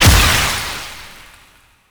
Projectile Death Tech Medium; Weapons; Warframe; Duviri; Incarnon Adapters

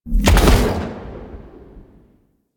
Iron Blast Cast Low; Warframe; Warframes

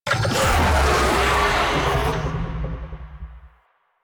Infested Oni Vocal Attack; Warframe

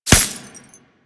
Helmet Impact; Warframe

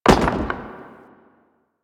debris metal stone; ambience; Warframe; Entrati

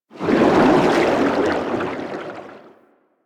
water movement by; Warframe; Vehicles; Sharkwing

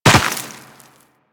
Land Dirt, Footsteps; Warframe; Tenno

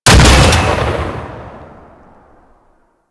Flak Cannon Fire; Grineer

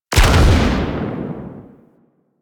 Railjack Ship Primary Cannon Fire; Warframe; DE; Vehicles; RailJackWeapons

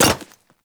Hyena Micro Step Default, Footsteps; Warframe

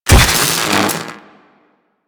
Electro Prod Hit Flesh; Warframe; Weapons

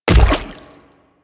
Splitter Step Water, Footsteps

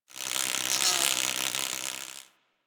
Forest Close Insect Buzz Fly By; Ambience; Warframe; Grineer Forest

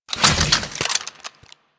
Shotgun Reload; Weapons; Warframe; Tenno; Alchemist

